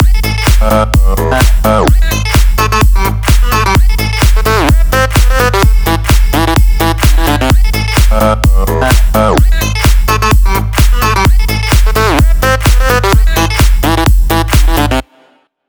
128 BPM MIX, Root Amin; Vengeance - Essential Clubsounds Vol.5; Demo Constr Kits; Highway Kit

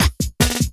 LOOP SD; Ueberschall; Drum & Bass Resonance

